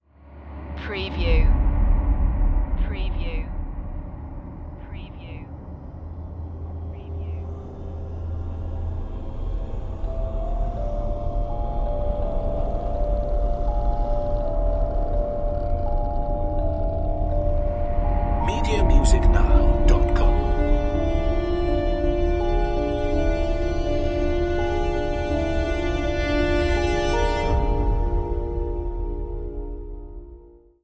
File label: dark rituals